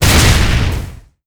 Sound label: Hit Heavy Volcano; netent